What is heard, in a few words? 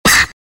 fight cut